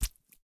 slime splash; CrossCode; battle

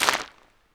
ragdoll hard hit, death; The Swapper

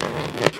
rubberpull, balloon; World Of Goo

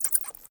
batsqueak; World Of Goo

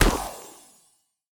ball hit cold medium; CrossCode; battle

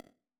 click; The Swapper

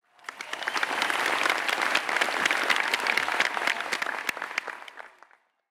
applause bronze; CrossCode

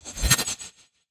barrier regen; CrossCode; puzzle

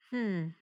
hmmm; lea

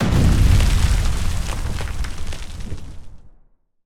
earth boom; CrossCode; battle

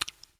stick; World Of Goo

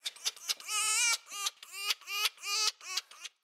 pet fox

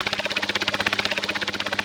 hedgehog propeller; battle

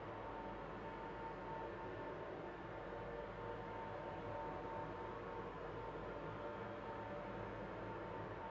cargo ambient out; CrossCode

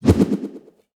samurai jump; battle

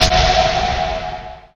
magic charge, battle; CrossCode

